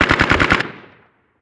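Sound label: gun ak47 distant; ModernWarfare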